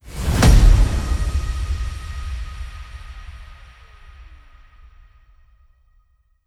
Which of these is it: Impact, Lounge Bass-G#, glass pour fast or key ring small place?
Impact